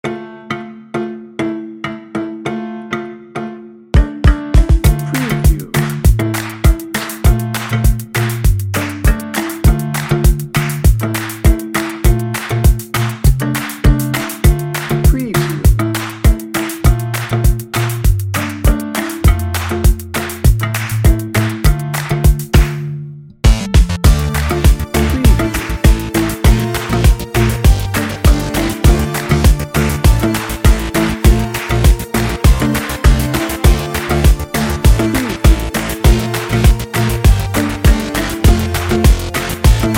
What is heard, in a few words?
friendly vibes bed